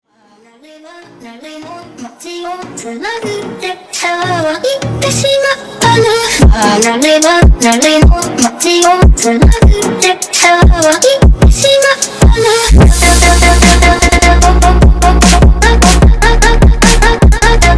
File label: Electronic